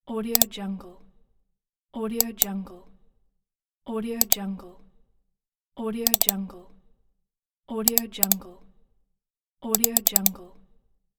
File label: computer mouse; sounddivision